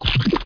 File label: lava drop bubble; Frogger 1997